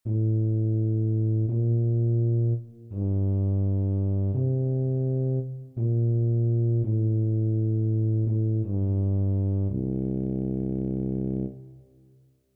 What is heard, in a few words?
Tuba; 吹奏楽; R6 吹奏楽部 卒部式